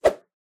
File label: Motion Swish